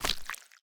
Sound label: step; minecraft; block